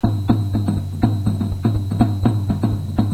drums

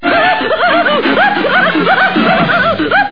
hoohoo

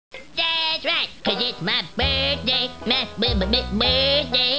birthday song

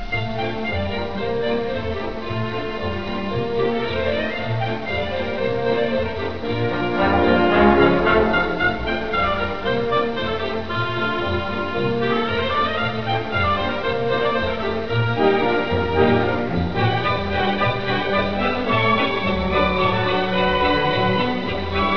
song, xmas

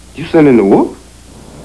wolf

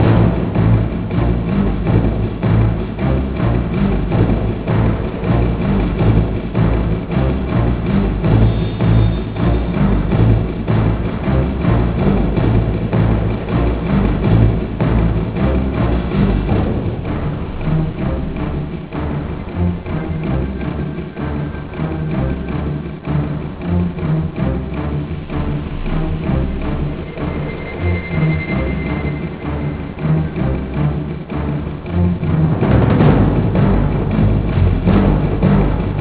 action low

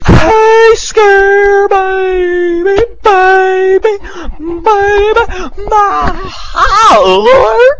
scare

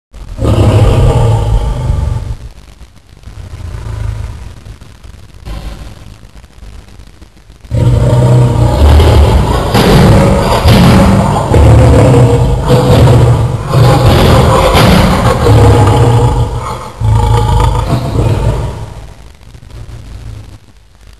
Lion

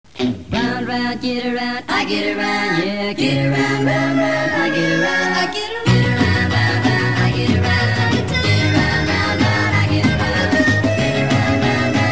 music

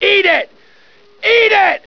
eat

